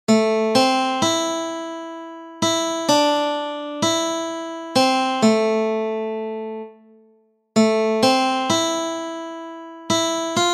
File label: melody notes; St james infirmary